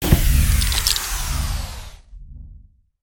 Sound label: fan smashable; destructibles